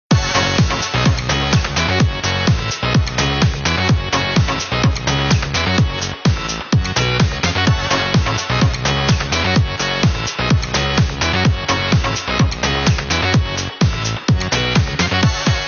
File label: Tooing Sms